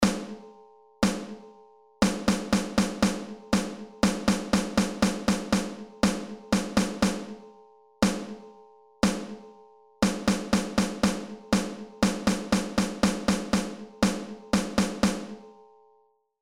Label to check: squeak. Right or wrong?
Wrong